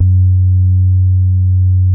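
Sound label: SUPPORT BASE, BASSES; Masterbits; Vintage Voltage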